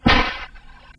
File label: snake strike